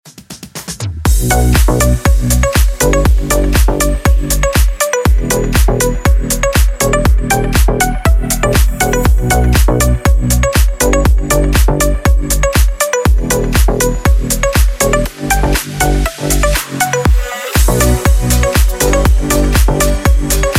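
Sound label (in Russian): звонок deep house без слов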